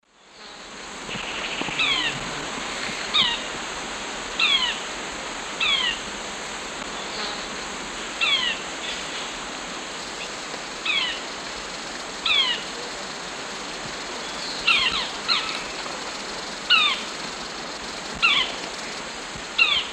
Myiozetetes luteiventris, calls pair; S. America; Eastern Lowlands

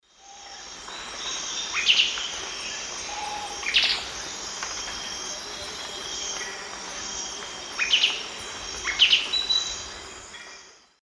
Thamnomanes caesius, call; S. America; Eastern Lowlands

